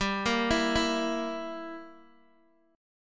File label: G Minor 6th, Guitar DADGAD